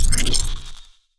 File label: Quest Started; Custom UI Sounds; Call of Duty Advanced Warfare